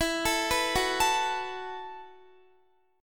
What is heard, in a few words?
E Suspended 2nd Suspended 4th E, down, Guitar; Esus2sus4; arpeggiated